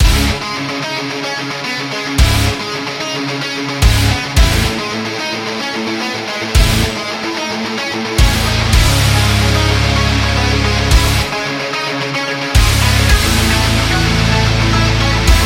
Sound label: The Heavy Metal